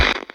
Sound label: radio out; Blind Drive